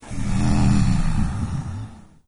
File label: idle breath; doom3; commando